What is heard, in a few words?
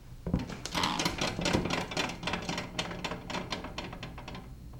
Weight Scale On